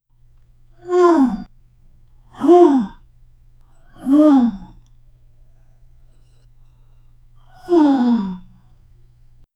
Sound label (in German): ein langgezogenes ahhh, effort